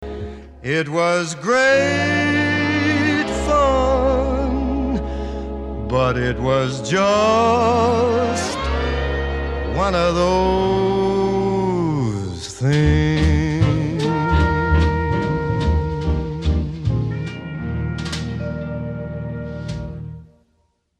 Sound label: vibtato colour portamento